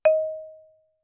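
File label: Marimba cord fortissimo Eb4; MIS Pitches - 2014; Percussion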